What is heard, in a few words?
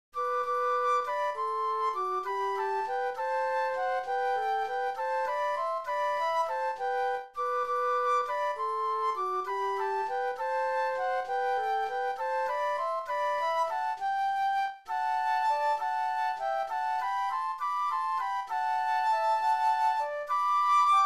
Flute Duet; christmas